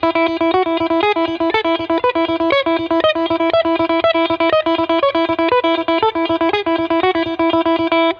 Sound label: Right Hand Speed Exercise